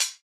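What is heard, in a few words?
closed hi-hat; eddyflux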